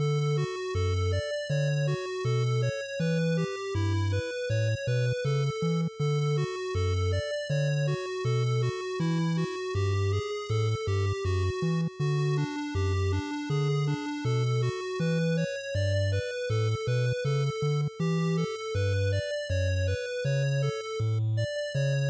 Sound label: NES RPG Field2 Loop80